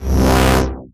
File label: FX Scratching; Vengeance Samples